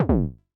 Ground Pound Attack; NSMBW

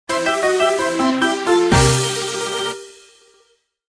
Minigame Draw; MP9 and MP10

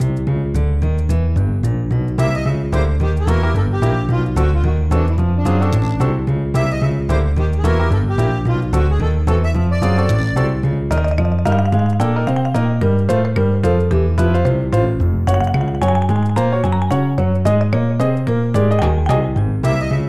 Slowly Bubble Up, theme

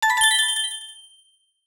Coin Group Appear

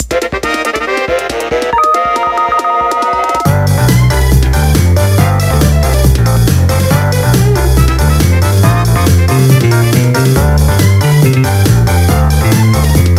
Underwater; 3DS; Piranha Plant Slide

